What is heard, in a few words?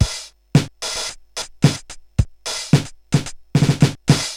Korg MR-16 (110BPM), beats; musicradar; cyborg beats